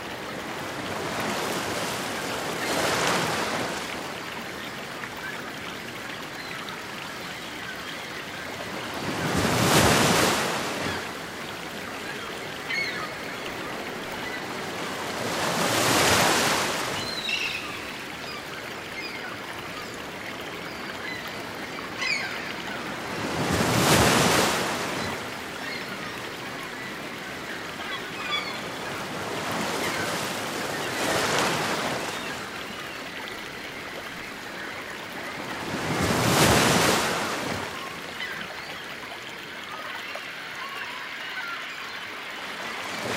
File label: ocean, relax